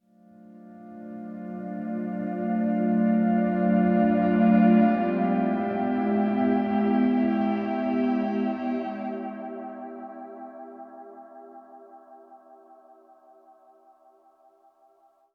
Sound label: BGM Lobby; metal mind; xbox series xs